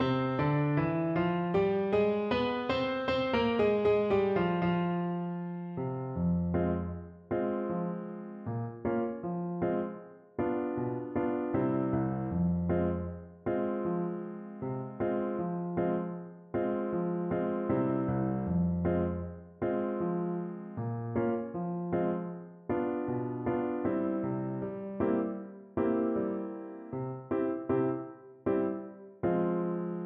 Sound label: freylekhs, Trombone